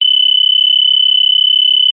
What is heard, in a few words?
multimedia game points tally